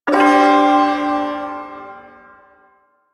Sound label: Ersatz Shawzin Pent Maj Chord; Warframe; Duviri Ersatz Shawzin